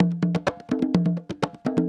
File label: Percussion Loops, Bongo & Conga; Houseworx